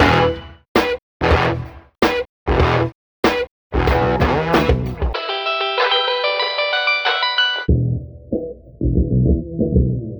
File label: guitar